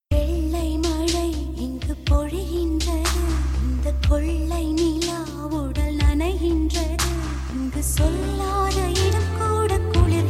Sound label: female